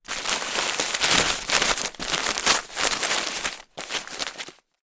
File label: wrapping paper movements; foley